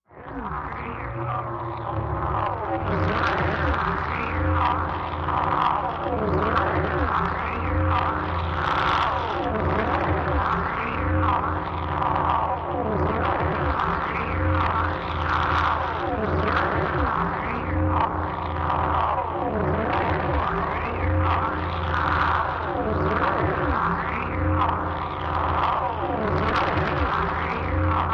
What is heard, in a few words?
Archimedes Resistor, Machine, Alien, Space, Slow Vortex, Sci-Fi